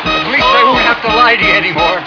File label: jazz